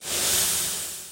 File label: slide